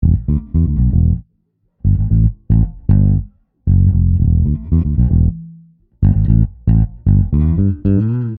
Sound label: Bass phrase